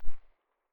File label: silencer mp9 mono very far, weapons